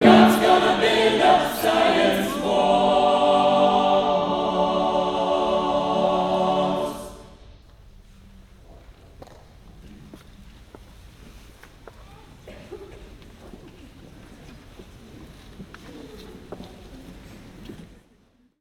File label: song and silence, pray church; best service Blue Box